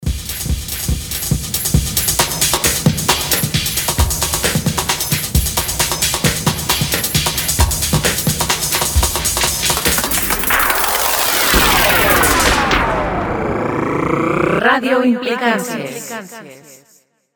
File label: jingle